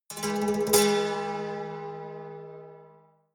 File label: haunted strings roll rattle